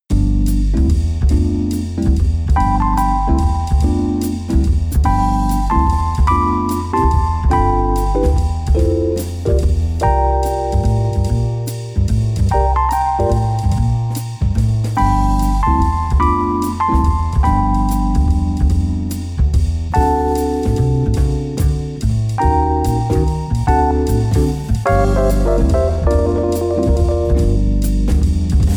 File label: lounge lizard ep 4; spundj